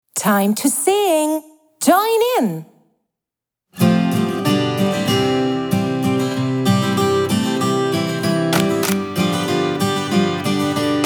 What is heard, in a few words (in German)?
englisch lied karaoke; ich und du